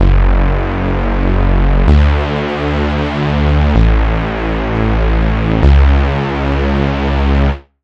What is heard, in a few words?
Bass, Sylenth1; Routed Sound; Sylenth1 Expansion - Star Scavenger Vol.1